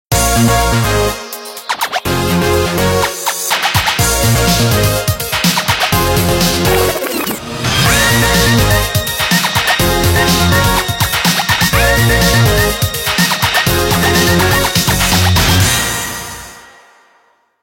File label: Nokia 6500 slide Ringtones; The trick